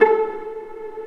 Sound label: Vla Piz A3; Digital Sound Factory Virtuoso